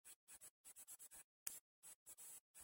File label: Bonus Tune; Aladdin; Genesis